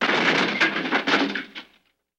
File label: Car Crash Light